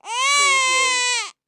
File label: Baby Cry Single; sound effects bible